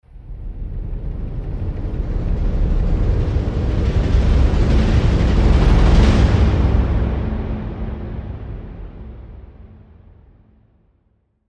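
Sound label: Percussion Wave Crescendo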